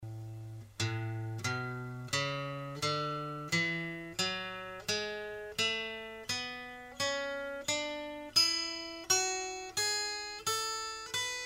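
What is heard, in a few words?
A major scale, guitar; guitar fundamentals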